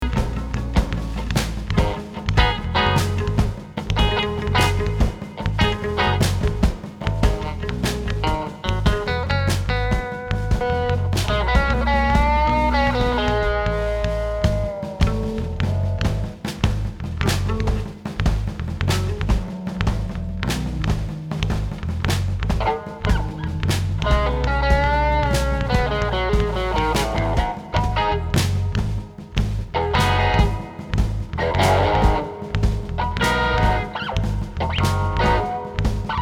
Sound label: Sample Bass